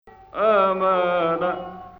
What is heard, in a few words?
Pseudo Sikah